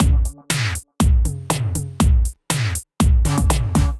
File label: Svensk House Kit, Drums; Ableton; Factory Packs; Build and Drop